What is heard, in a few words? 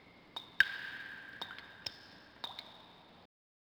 drip; portland